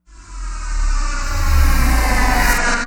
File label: PLUNGING; ILIO; Trance Fusion; STOP LONG